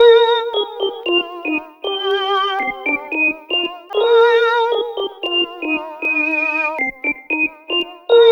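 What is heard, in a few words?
extra lead (spooky); Sonic Foundry (Sony Creative Software); Ugly Remnants VOL-2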